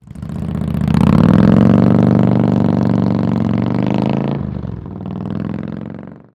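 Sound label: TAKEOFF, motorcycle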